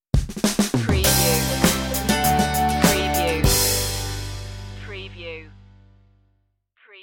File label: family tree stinger